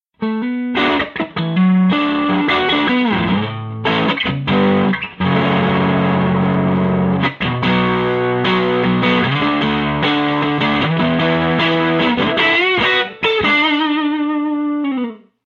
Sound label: overdriven